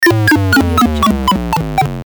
8bit death

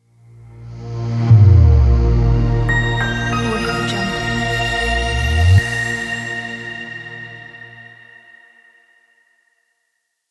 abstract airy logo reveal

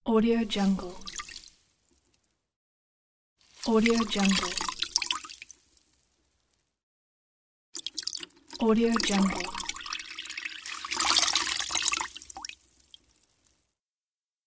washing

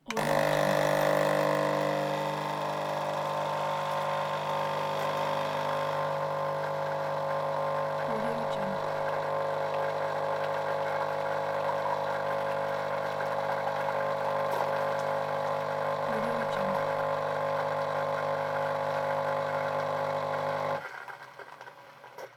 small coffee maker

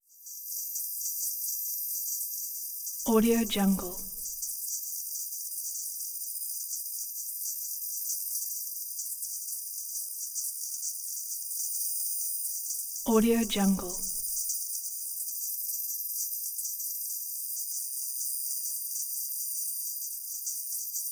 dark scifi drone layer

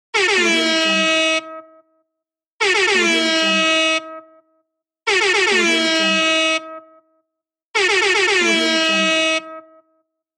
air horn

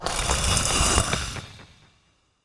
ambient game movement stone tile button; esmteam

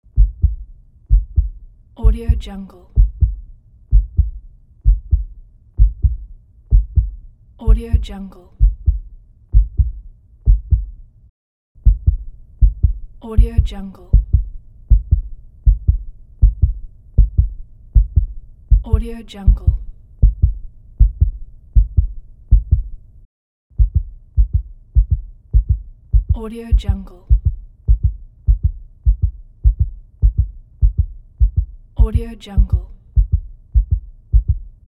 heartbeat

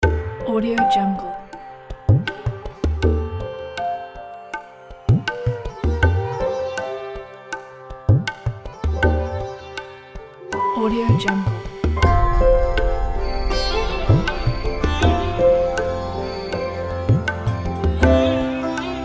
emotional beautiful india sitar piano

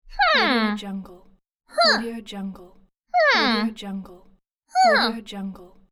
hmm girl voice

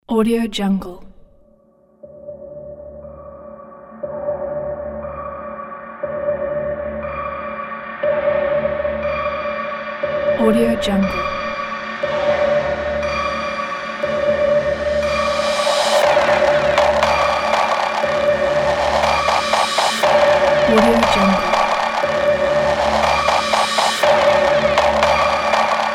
dark action cyberpunk trailer; iamaudio

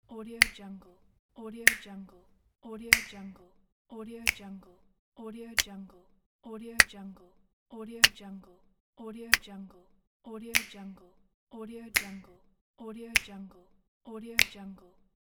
finger snap

